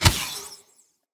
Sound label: Elemental Radiant Arrow Explosion, Bows; Weapons